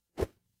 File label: paper fabric cloth whip